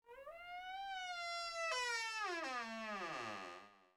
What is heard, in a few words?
door squeak, household sounds